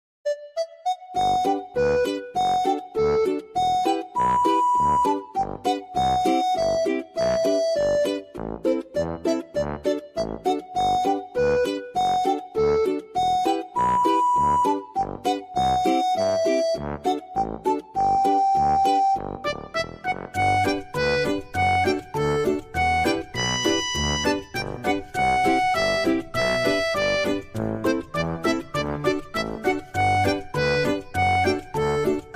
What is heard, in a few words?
music; dino